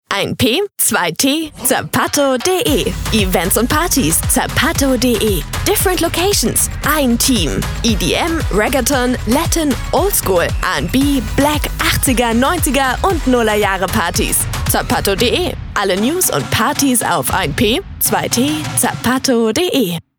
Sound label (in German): Musik; ZAPATTO